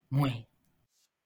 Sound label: mwah